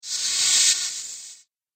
Robotic Steam, sci-fi robot sound effect; Sci-Fi Robot Sfx; Andrew Fleming

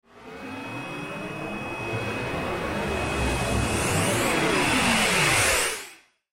Sci Fi Spaceship; Spaceship Charge Whoosh; Spacecraft

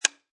Rifle Bolt Action Dry Fire; Rifle: Bolt Action, Dry fire / misfire click.; Gun Handling Sound Effects

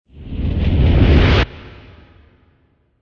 Rush Short Tail, Rushing sound; Rush 8 Short Tail

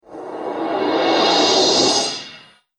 Faux Reverse Cymbal Whoosh; Whoosh Sound Effect; Swish, Zoom, Swipe, Whoosh, Swoop; Andrew Fleming

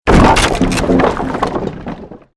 created wooden structure crash medium

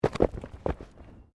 Body movement Impact Roll Road; Body movement foley to simulate falls and deaths; Rolling

